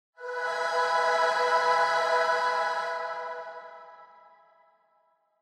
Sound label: Angel High Long